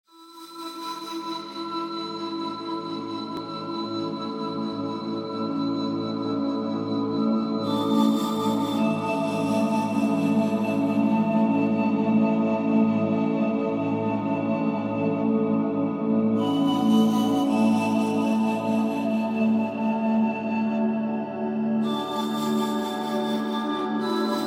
Flutes At The Spa

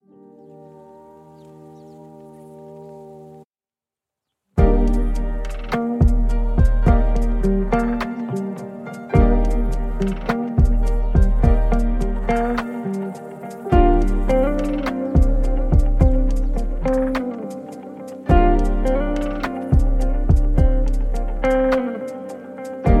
lo-fi instrumental

